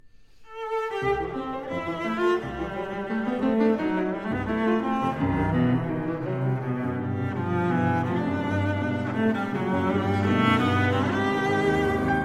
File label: Sonata for cello and piano, Allegro ma non troppo